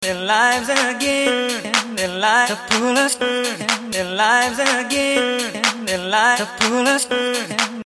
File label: Accapella